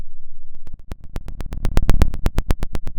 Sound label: Sound of a fire crackling